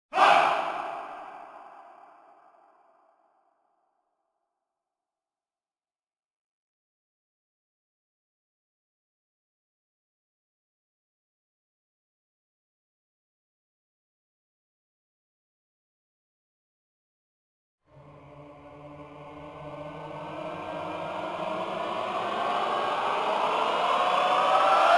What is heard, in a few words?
choir only